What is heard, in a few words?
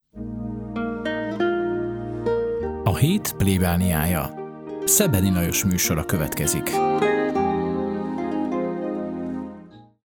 Jingle; A het plebaniaja bevezeto